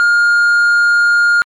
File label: answering machine beep